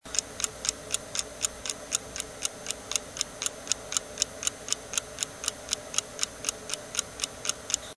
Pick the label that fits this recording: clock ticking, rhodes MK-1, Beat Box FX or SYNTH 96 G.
clock ticking